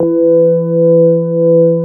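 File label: CX3 organ E3 L, Keyboards Old School; USB Soundscan vol.09 - Keyboards Old School; AKAI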